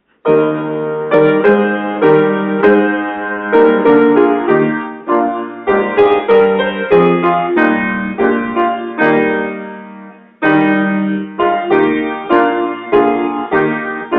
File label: piano